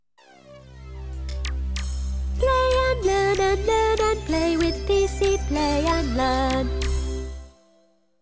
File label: Sting; PC Play and Learn